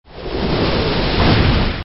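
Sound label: air flow sound effect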